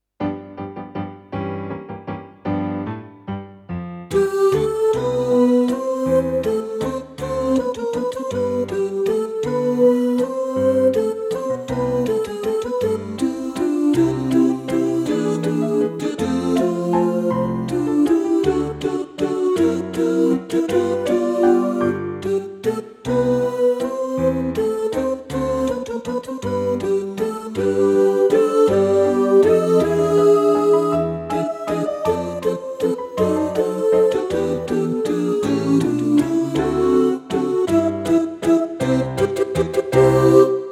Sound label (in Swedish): Beethoven Alt